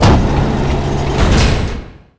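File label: door mine open; doors elevators; Star Wars Obi-Wan; XBOX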